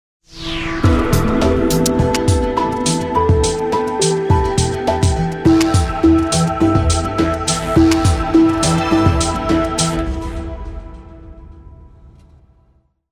virgule charme

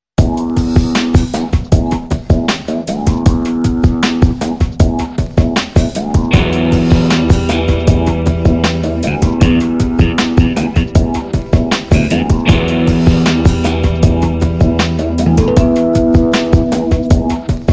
ELECTRO RANDONNEE

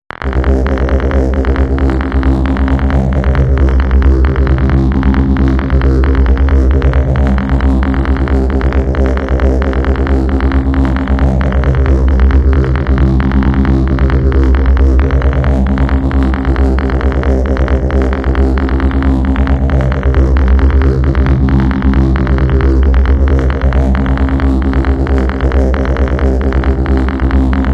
SEQUENCE AMBIANCE DES CARTES

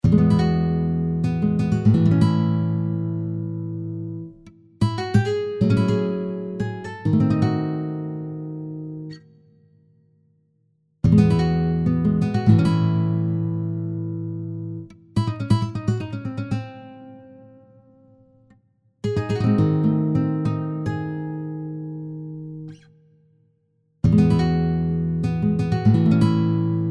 GYPSY CORDES

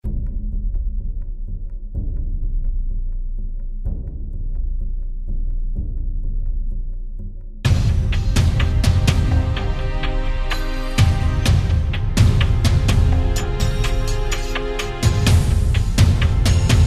SERIE DRAMA SUSPENSE

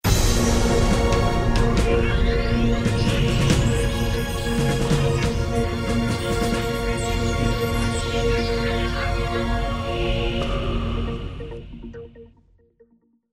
jingle

